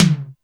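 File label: Tom Md Blade, Alesis D4 drum machine; 300 Drum Machines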